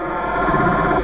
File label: Slab Open